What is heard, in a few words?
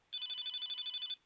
ericsson t39 ringtones